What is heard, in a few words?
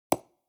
construction wood